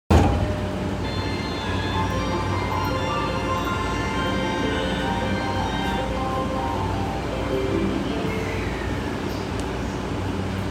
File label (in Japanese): 湘南新宿線 保土ヶ谷 北, train sounds